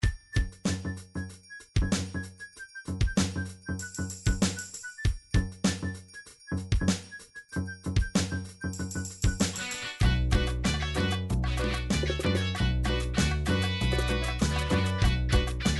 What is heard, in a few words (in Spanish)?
cumbia